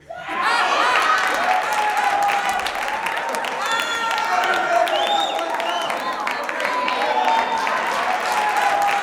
JUBILATION, Vocal Gospel

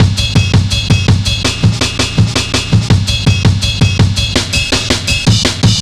165, drum loops, drum & bass

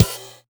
Loop, Drum Machines, Yamaha DD-5; Yamaha DD-5 Ableton Project; Processed

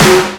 SNARE, Hip Hop; E-MU Formula 4000 Series Vol. 1; Hip Hop Nation

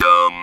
Talk Box "dum"

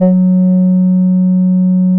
F#2, synth; E-MU Producer Series Vol. 6 – Analogia Project; Strictly Synthe1

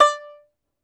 Tenor Banjo, Plucked Strings

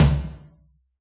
pop kit low tom

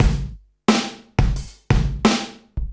CB LOOP; Zero-G; Chemical Beats

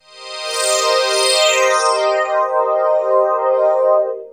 Phase Away Cmin, Pads; Zero-G; Total Drum Bass

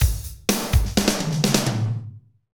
Medium Tekno; Northstar - Drumscapes Roland

